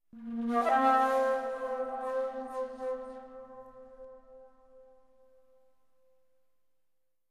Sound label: pipe; Myst III Exile